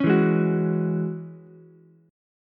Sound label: E 6th Add 9th E down Ukulele C6; E6add9 strummed; JGuitar